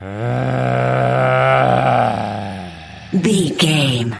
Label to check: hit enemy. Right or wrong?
Wrong